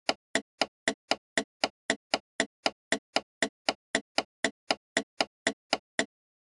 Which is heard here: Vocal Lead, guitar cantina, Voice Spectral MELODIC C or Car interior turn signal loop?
Car interior turn signal loop